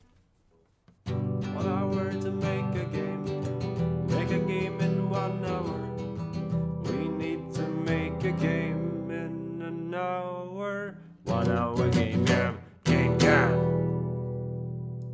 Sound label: Full theme song; Full theme song of One Hour Game Jam; By bitslap